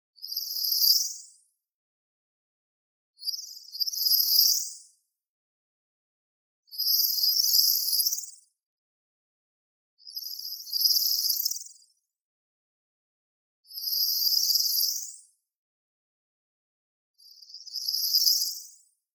SHIMMER Reverse Bell Shake Shift Delay; boom library; Anime Essentials; DSGNMisc

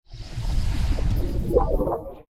Boiling; Blind Quest - The Frost Demon

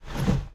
driver foley wheel turn; Blind Drive

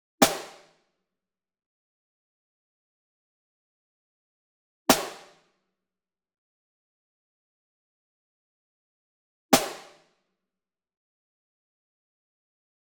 Single Snare Pearl Marching Hit Near; boom library; Cinematic Strikes Construction Kit

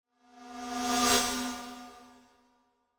Static Buzz By Thin Buzz, Creepy Pass; Hollywood Edge; sound designer's toolkit v2

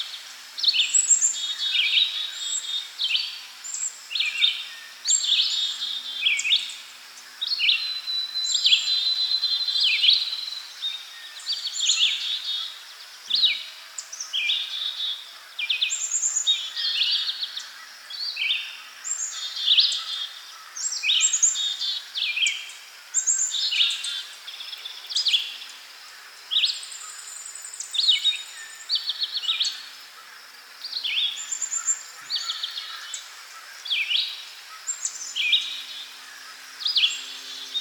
birds in background; Inquisitor 2

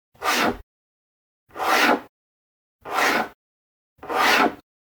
Generic Slide; boom library; The Interface

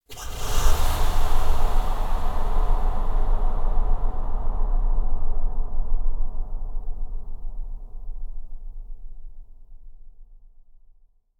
Impacts Distant Multi Hits Breaths Finale Hit; Hollywood Edge; sound designer's toolkit v2

